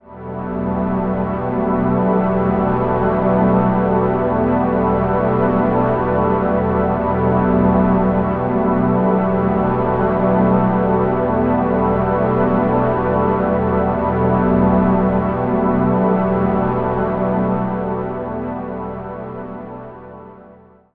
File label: Ambient Pads; Zero-G Seismic Frequencies